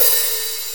Snappy Open Hat Sample F# Key, Royality free open hi hat tuned to the F# note